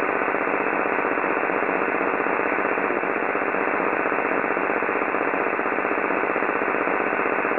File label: websdr 11.5 kHz